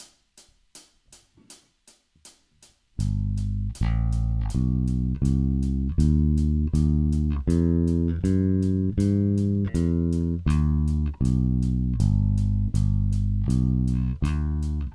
walking bass

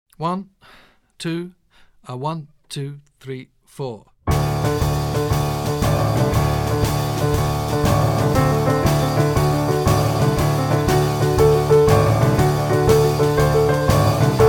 Five to One Shuffle, teclado jazz

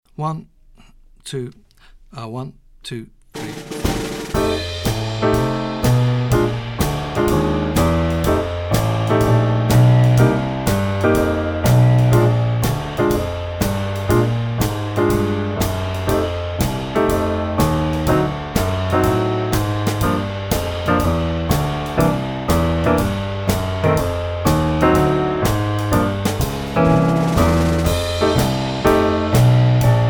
Blues in F Comping with walking bass, teclado; jazz iniciante

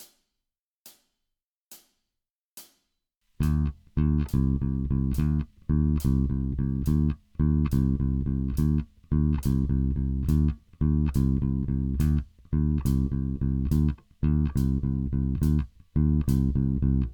Heavy rock blues, baixo; heavy rock shuffle

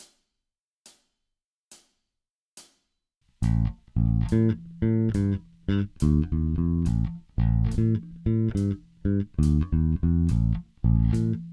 shuffle blues, baixo

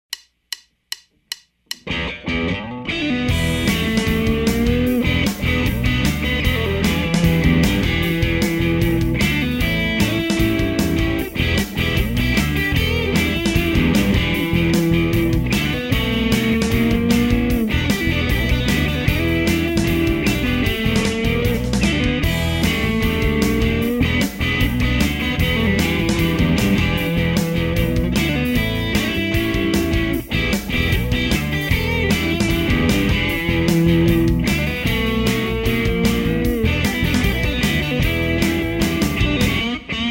Hard Rocker Full Tempo, hard rocker rhythm

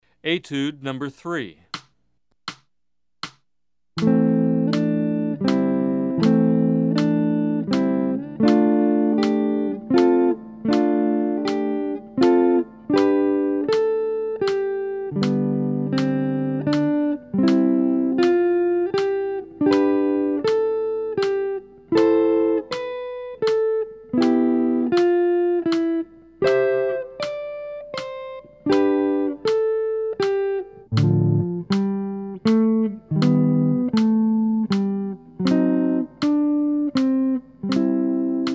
Guitar Track Beginning Jazz Guitar; etude 03

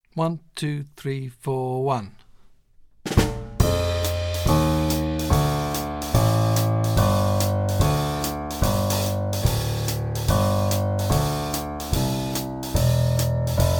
backing track, teclado; jazz iniciante; left hand chords

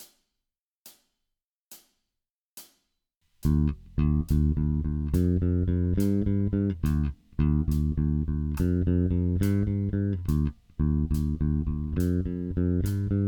Heavy rock blues, baixo; heavy rock shuffle

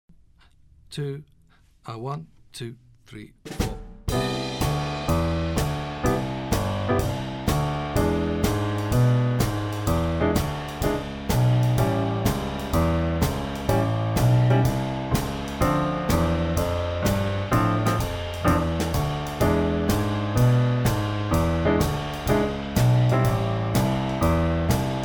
Walking bass Rootless; teclado; jazz iniciante

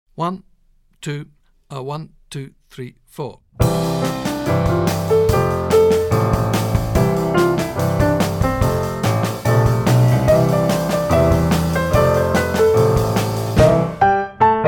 Straight Eight, teclado, jazz; jazz iniciante

